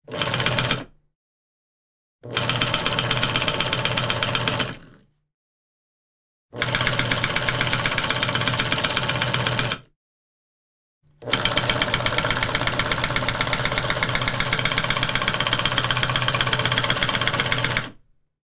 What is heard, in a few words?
machine